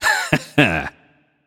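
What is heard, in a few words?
nonverbal heh heh; voicepack; smite; merlin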